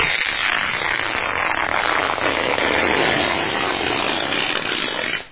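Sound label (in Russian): длинный звук разрыва ткани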